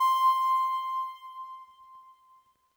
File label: Synth C, Synth Prophet One Shots; Electronisounds